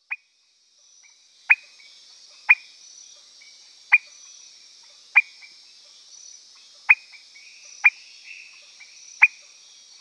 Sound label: Leptodactylus petersii